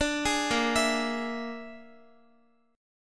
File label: Bb 7th Flat 5th Bb Guitar, chord; arpeggiated; x,x,12,13,10,11; 4E,4A,5D,5G,5C,6F; Bb7b5